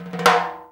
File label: TIMB ROLL; Greytsounds VOL-1; SDX PERC